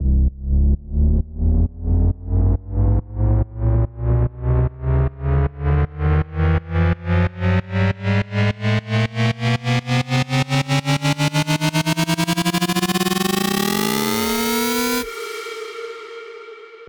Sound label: 128BPM Rise Up 8bars; Shockwave - Fx Elements vol 1